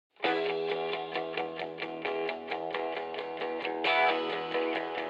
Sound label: guitar